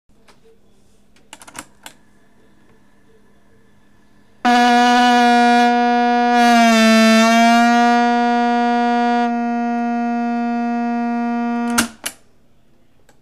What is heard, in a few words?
honk